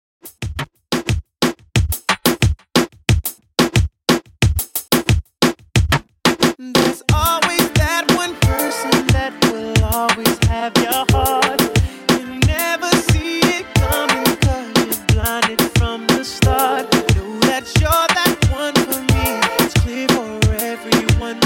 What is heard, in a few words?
Reggaeton 90 Bpm; djyan